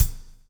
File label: BLUES TK, Backbeat; Spectrasonics - Backbeat